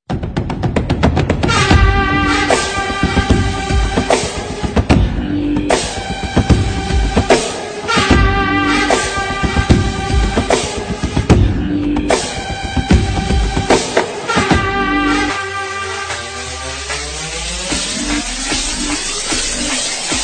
background music

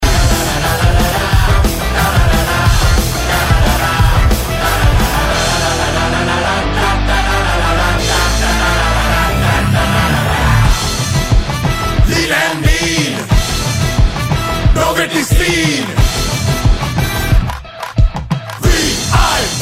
music, tamil ringtones; velaiilla pattadhari 2; vip

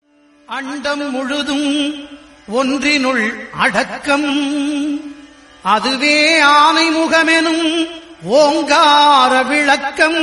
vinayagar cut songs tamil; hindu

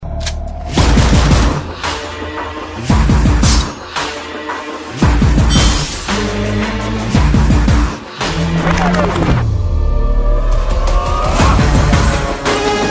bgm

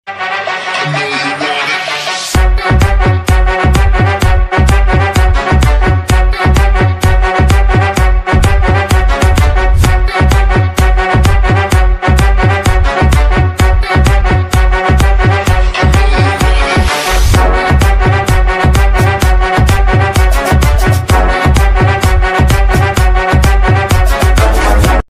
awake bgm ringtone

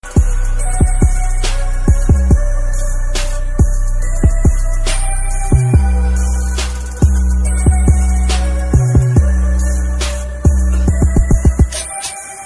motion poster bgm ringtone; tamil; nerkonda paarvai; ak59; ajiths

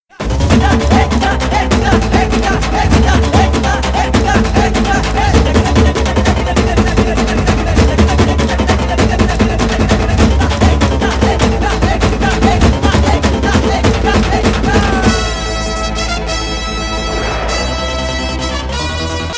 local bgm

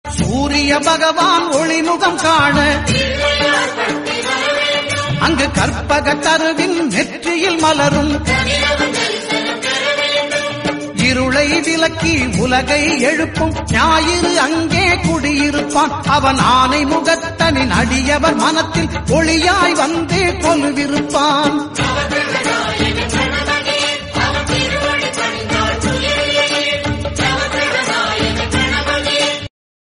tamil devotional cut songs

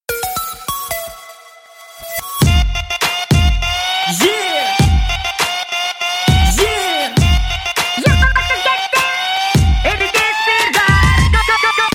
ringtone

